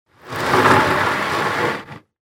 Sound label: Foley Movement Wood Slide Through Dirt